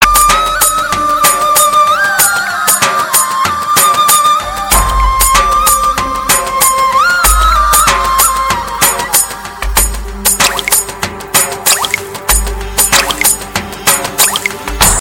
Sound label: Taal instrumental